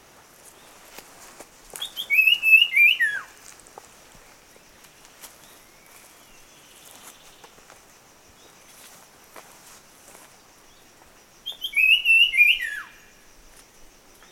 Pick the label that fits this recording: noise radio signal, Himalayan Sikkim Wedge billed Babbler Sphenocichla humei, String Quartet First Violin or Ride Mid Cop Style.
Himalayan Sikkim Wedge billed Babbler Sphenocichla humei